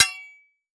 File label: Weapon Broad Sword Hit Metal Tink; AdobeCC